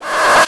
Multimedia Internet CD-Rom Flash Hi-Tech

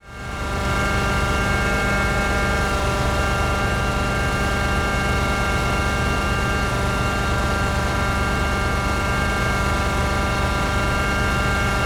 Industry Tool Air Compressor Factory

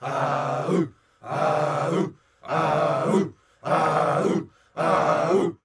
Human Crowd Cult Chanting

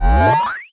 Science Fiction Sci-Fi Electronic Laser

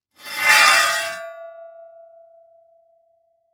Impact Street Sign Drag On Cement Short

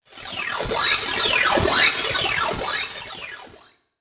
Title Transition Data Transmission Computer; Production Element Title Transition

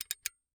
Industry Metal Clink Gear Slow

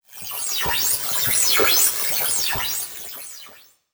Production Element Title Transition Liquid

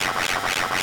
Sci-Fi Electronic Robot Communicating; Science Fiction

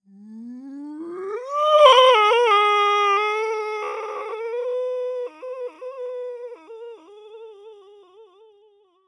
Horror Scary Ghost Moan In Pain Ghost Moan

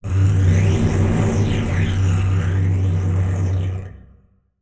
Transition Electrical Distortion; Production Element Title Transition

